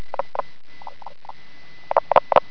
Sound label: Pelobates fuscus chant